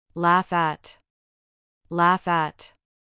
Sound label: laugh at